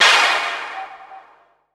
loop; X-Static Goldmine 3

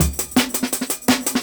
Jungle Breaks

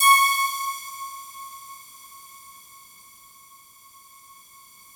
FOGATK2 C#5, Yamaha VP-1; Music Store; 90's Sample CDs; FOGATTACK 2

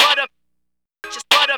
voc E min, Hip Hop & RnB; ProSamples vol.34 - Hip Hop & RnB 3; Just Butta

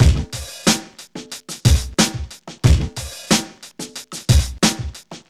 SLICK 91, Funky Elements LOOPS; Zero-G

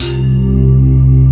MERLIN C2, SD1 BELLS; East West - Global Sounds

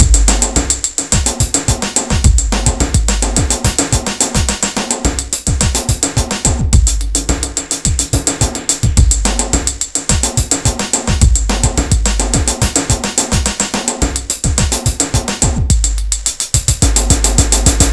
Beat, Drums & Percussion; Electrocution; Beats

